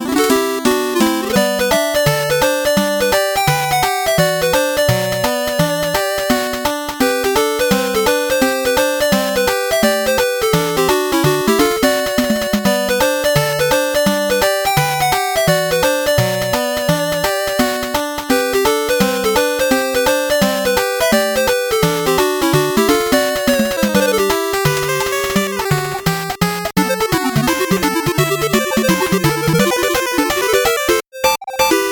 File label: 8bit bgm normal